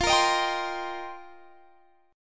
F 7th Suspended 2nd Suspended 4th Guitar Half Step Down, chord